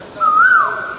kuil bird sound, koyal; pakshi ki aawaj

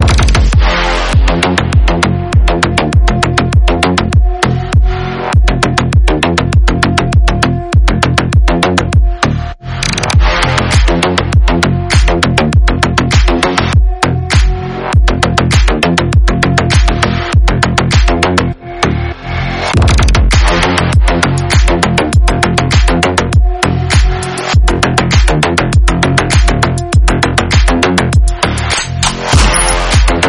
cool party mix upbeats

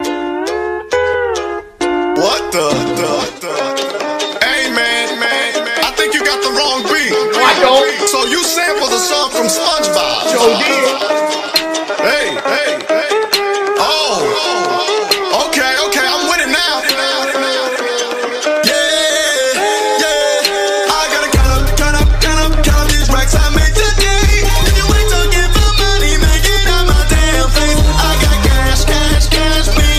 romantic instrumental bengali

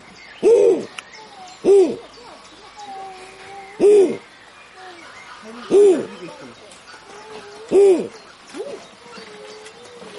snowy owl sound

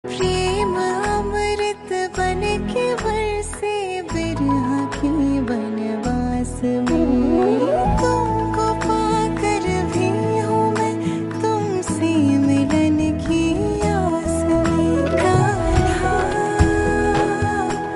female version; radha krishna

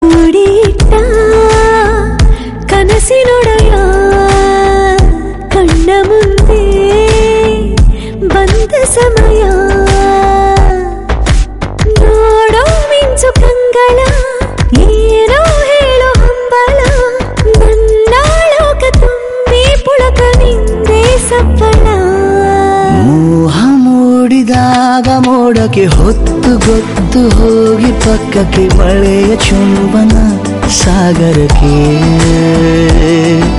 kannada song

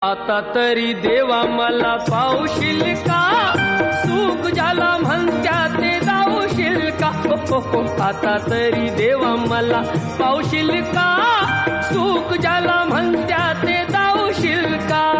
marathi

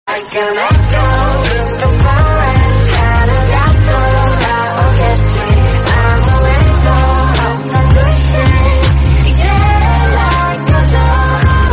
korean song

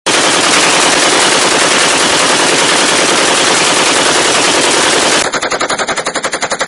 gun firing ringtone gun firing ringtone

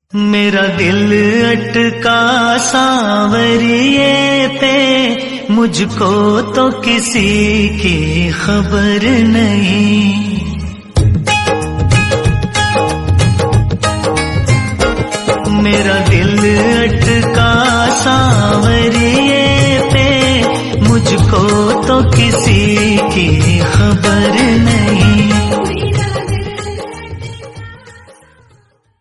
bhajan

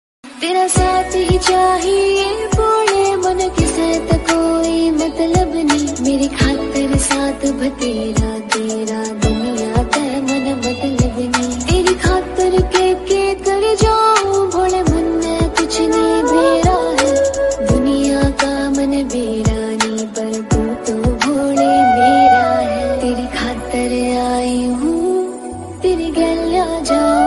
hindi devotional

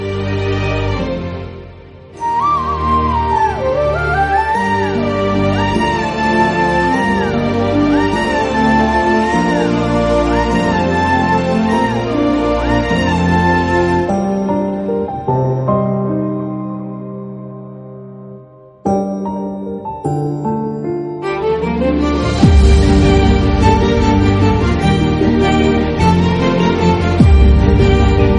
soulmate unite flute instrumental; thiruchitrambalam

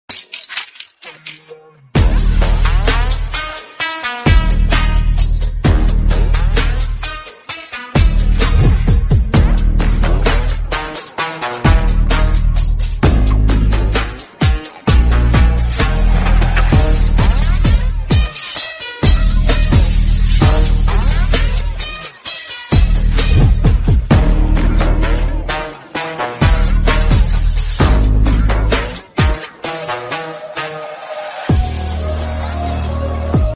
bgm, korean instrumental

